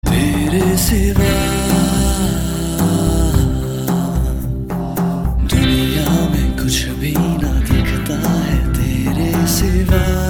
Soft Tone; Bollywood; Delhi Belly

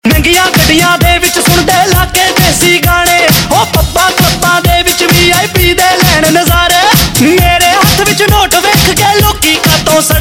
Punjabi Version Ringtone

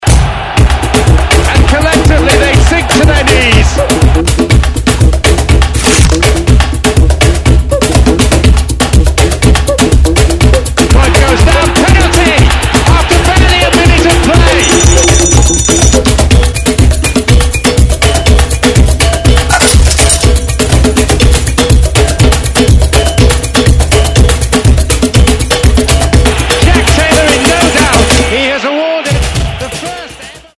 TV ad music; Star sports Road to Glory Brazil

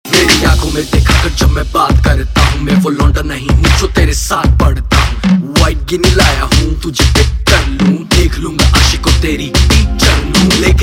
RAP Ringtone, Punjabi

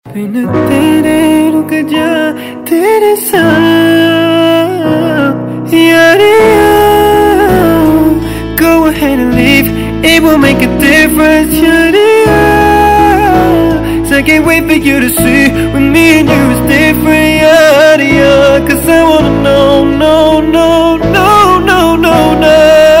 Acoustic Ringtone

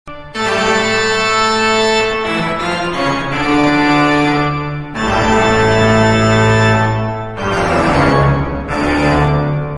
Toccata Short

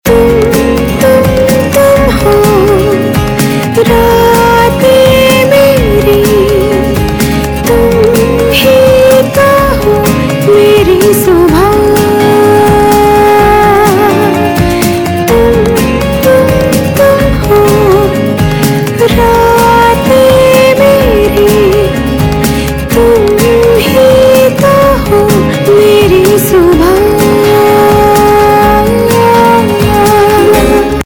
Female Ringtone; Bollywood

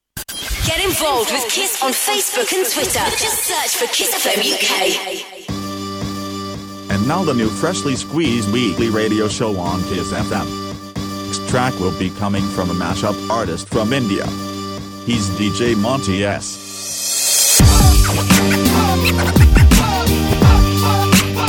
Outro Scratch; Kiss Fm Uk

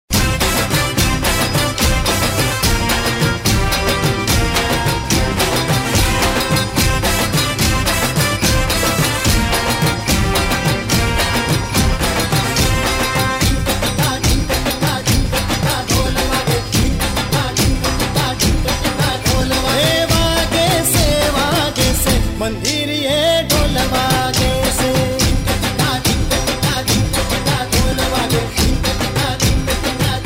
Dhol; Navratri Garba; Khelaiya Dandiya

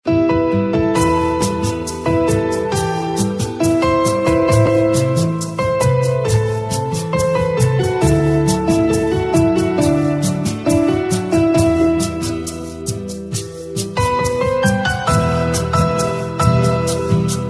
Instrumental Ringtone

